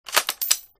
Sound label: cycle, guns; scout